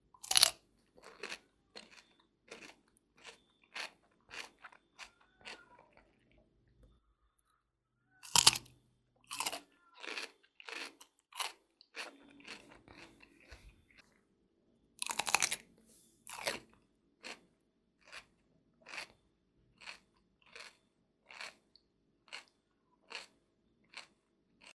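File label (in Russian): три варианта откусывания половинки огурца; Звуки откусывания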